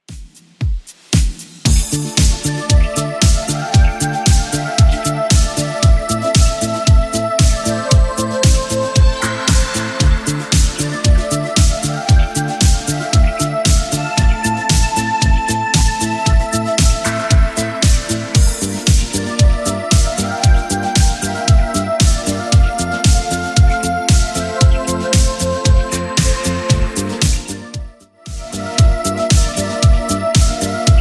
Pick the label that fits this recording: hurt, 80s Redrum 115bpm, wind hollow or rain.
80s Redrum 115bpm